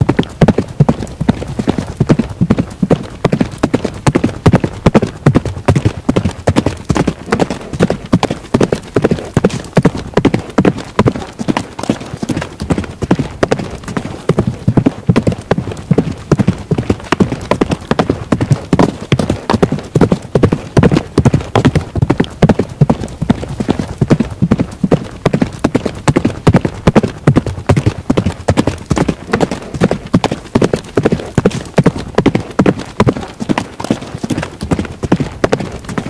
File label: gallop